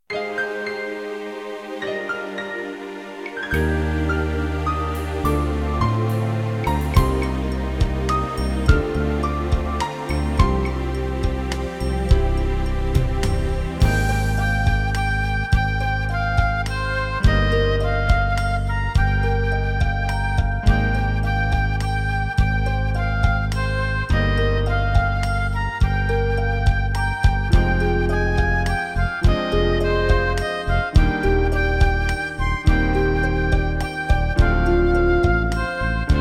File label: loop